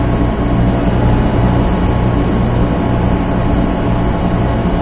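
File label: ambience machine; dm criticality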